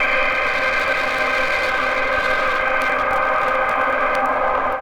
Polaris Rave Dirty Vinyl Synths C3; vinyl chords

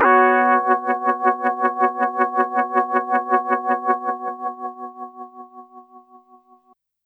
Synth, Waoooong chordz

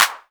Clap 909

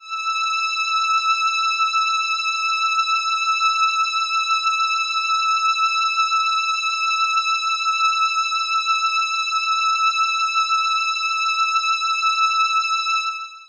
Warm Strings Kawaii E5; pads

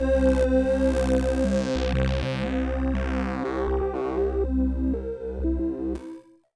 Pad C2; Contra

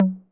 Tom Hi 808 Saturated B

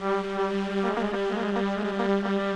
Persian Steps Trumpet G2

